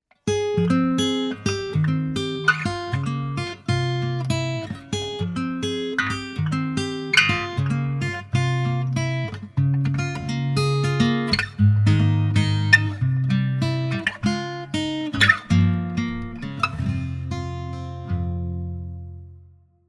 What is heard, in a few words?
maple fingers, guitar